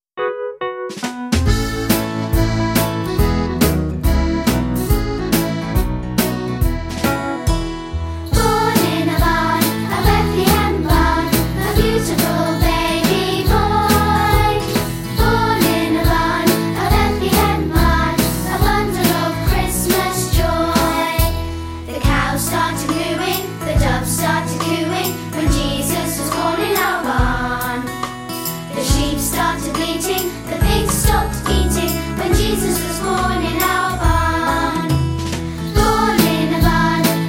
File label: Born In A Barn, vocals; children